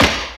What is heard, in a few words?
FX DRM, Analog; Sound & Vision - Gigapack II